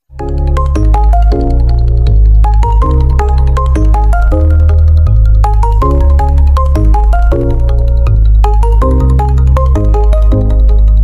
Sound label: Tech Echo, ringtones